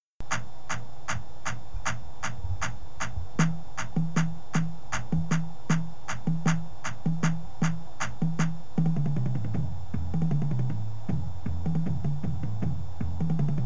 bass, drumline

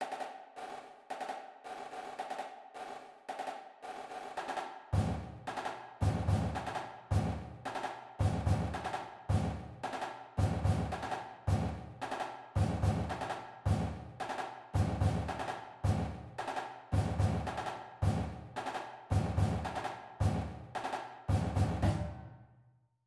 de-fense, drumline

